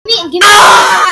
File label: screamiezzzz